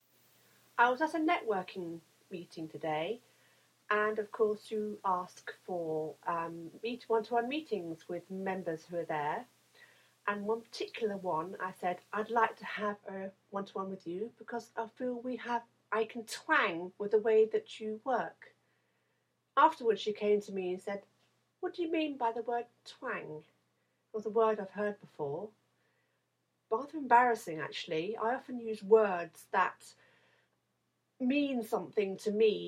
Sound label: Twang